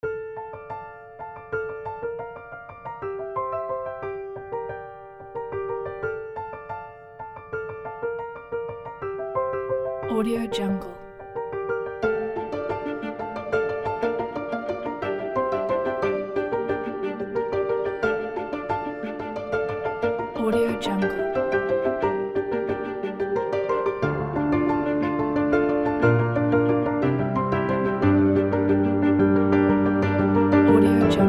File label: happy upbeat inspiring magical piano